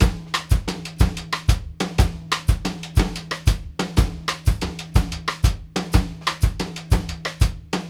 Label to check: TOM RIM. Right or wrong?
Right